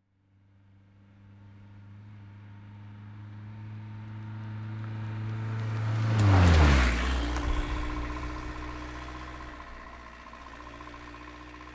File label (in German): Auto vom links